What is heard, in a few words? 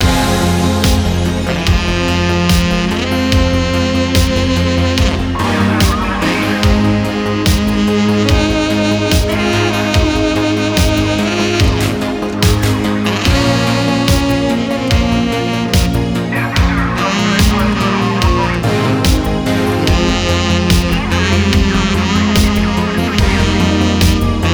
main game loop; netent